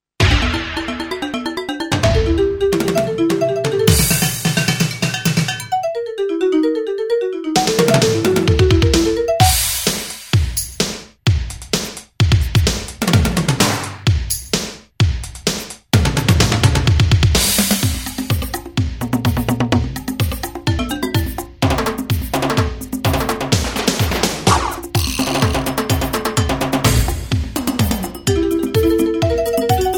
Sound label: alesis d4